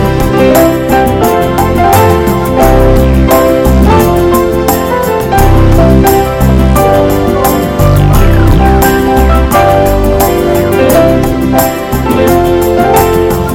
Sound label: Great Orchestra